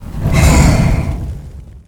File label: Son Of The Flame Attack